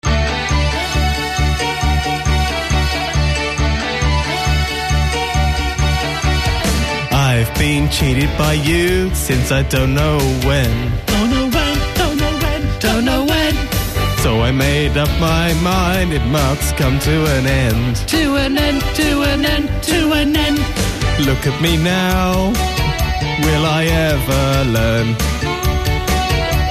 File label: sings